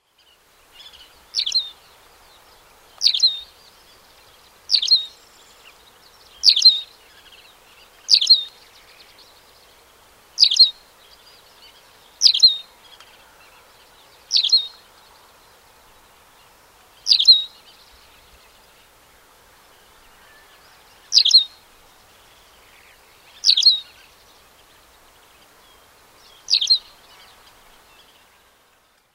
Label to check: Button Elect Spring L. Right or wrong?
Wrong